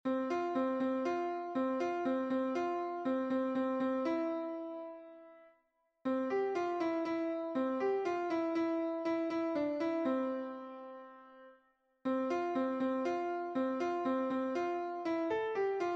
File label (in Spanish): contralto, himnos en piano; 65 TE VENGO A DECIR; cantos del camino